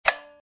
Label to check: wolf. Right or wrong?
Wrong